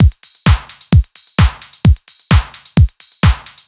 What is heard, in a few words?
Taspo Drums; 90’s Eurodance